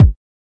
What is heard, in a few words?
kik